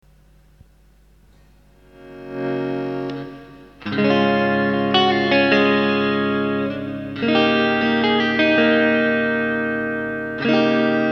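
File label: BOSS RV-5 Digital Reverb, PLATE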